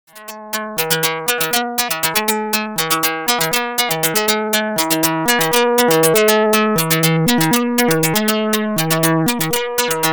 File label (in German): EFFEXX RT1701 String FX Karplus Strong Synthese; Radikal Technologies